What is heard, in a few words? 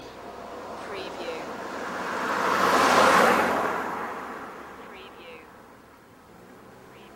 Car Driving By